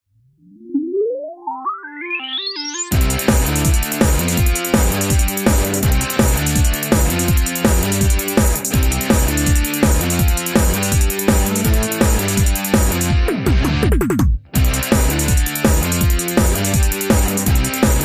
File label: speed of light squared